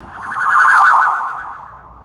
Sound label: short high pitched synthetic pass by; magic-scifi